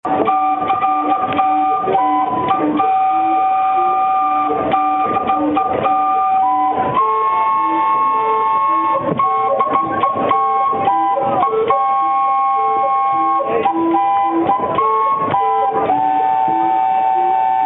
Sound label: calliope; minne ha ha